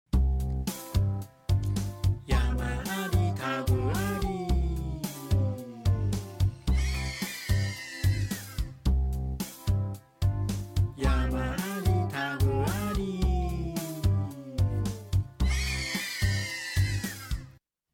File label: jingle